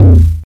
TOM, Drums Dance & Groove; USB Soundscan vol.11; AKAI

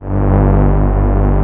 PA, ANA PAD

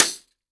snare drum, jungle snare chromatic

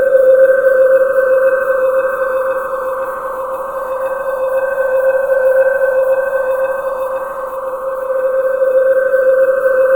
PHASE VOX, BREATHY PADS; Zero-G Ambient 1

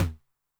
Noise Low, 909, MID TOM

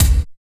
97 KICK 2 -R; Phat & Phunky; East West

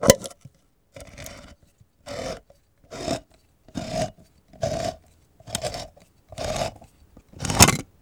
CAN OPENER, KITCH

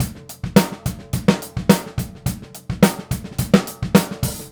Straight Kit, World Pop Beats; Sonic Foundry (Sony Creative Software)

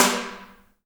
HAIRCUTS, KENYA DRM, Heart of Africa

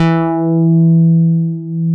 MOOG1 BASS E3

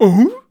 Mouth Fx, Vocal

